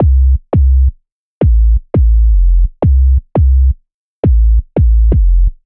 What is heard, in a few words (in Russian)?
Future Bass, бас сэмпл drum bass